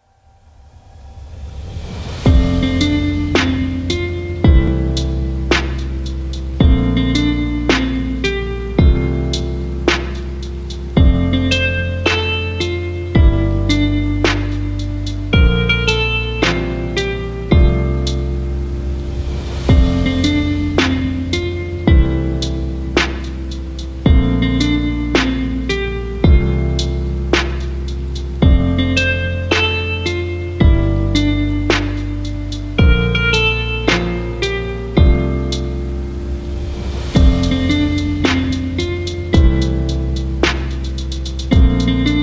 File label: background music